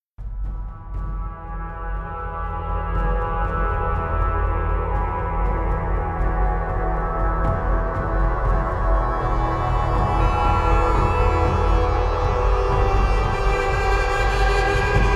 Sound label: Epic intense modern big-percussion